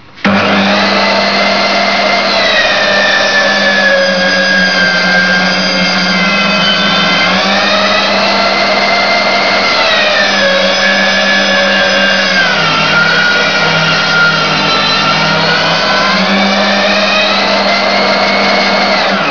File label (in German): SAEGE